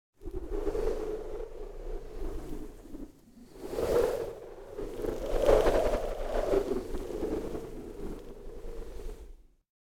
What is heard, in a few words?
Wind Gusts High Whoosh Cold Blizzard; Hollywood Edge; sound designer's toolkit v2